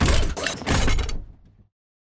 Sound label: combat run turn; Titanfall 2